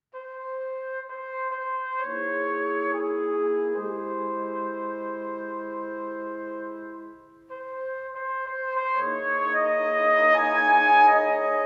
Trumpet

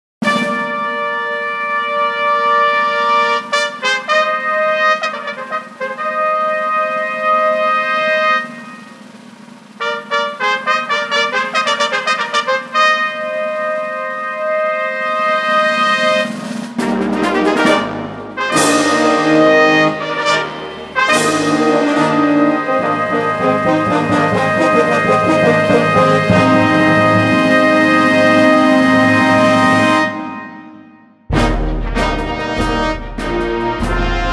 March

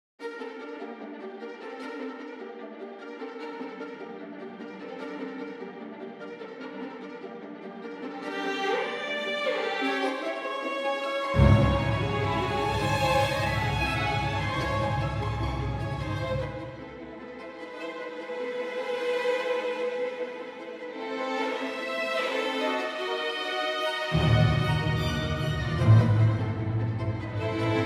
Baroque

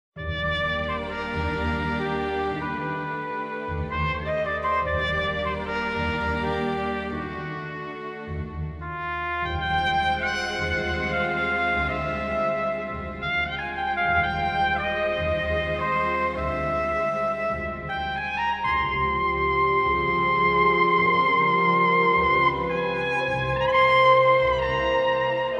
Romantic Blues mix

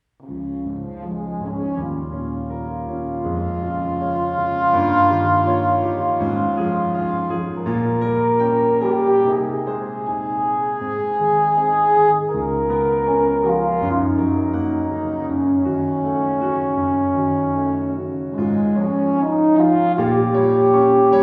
Vienna Horn Variations

